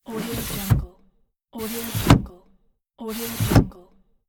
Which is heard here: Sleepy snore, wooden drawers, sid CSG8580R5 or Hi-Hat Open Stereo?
wooden drawers